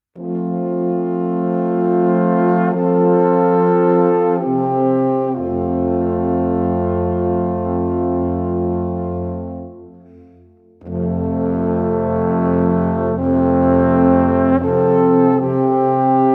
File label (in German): Alphornwalzer